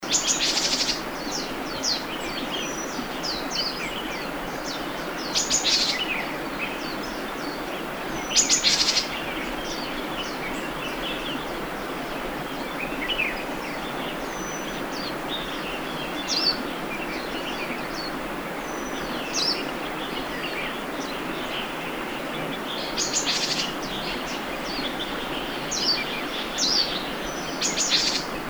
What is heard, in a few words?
Cinereous Tit Ssp cinereus Call; mesange cinereus parus cinereus ssp cinereus; Shenzhen Wu Tong Shan